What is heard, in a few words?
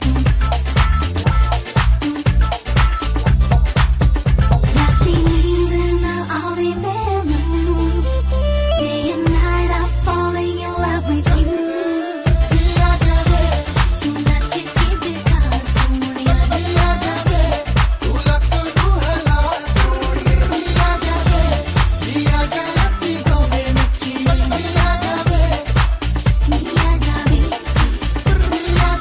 romantic